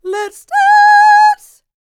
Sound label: LICKS, Vocal R&B & Dance; ILIO; Vocal Planet